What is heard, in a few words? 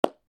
clap; pyro